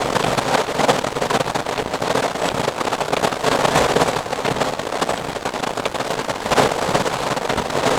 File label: FX Ambient; Drums & Drones